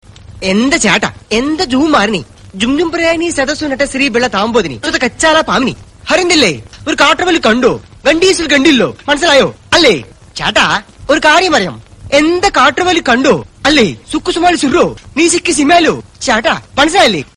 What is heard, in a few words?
telugu ringtone